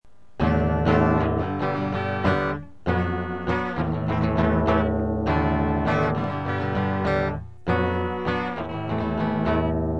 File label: gtr, Fender Stratocaster; ボディは日本製ですが、ピックアップはアメリカ製です。